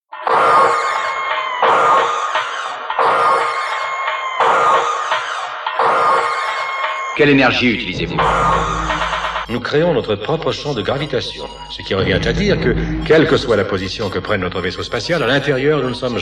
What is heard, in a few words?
Jingle